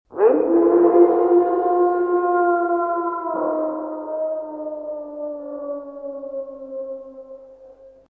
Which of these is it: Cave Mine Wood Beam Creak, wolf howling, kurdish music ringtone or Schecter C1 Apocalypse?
wolf howling